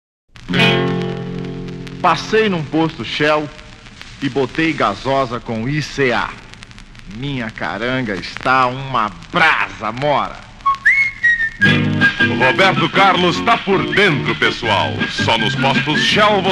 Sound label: jingle; shell